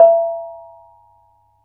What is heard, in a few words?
BONANG E5; Spectrasonics; Heart of Asia